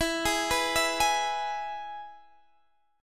Minor Sharp 5th; Gsharp; E; x,19,18,16,17,16

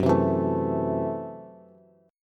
F sharp Minor 7th Flat 5th F sharp Bass

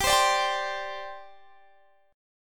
G Suspended 4th, Guitar, Standard; x,22,22,19,20,20; strummed; capo=3

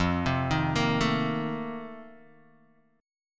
F 11th F, down, Guitar DADGAD

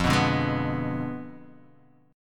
F Diminished 7th F down Guitar Half Step Down

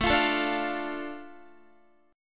A Suspended 4th Sharp 5th, Guitar; Asus4#5; strummed; x,12,15,14,14,12

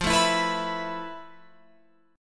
F Major Sharp 11th, Guitar, Open F; strummed

